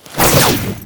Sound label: Ninja Stealth Hit React, Foley; Tau Dax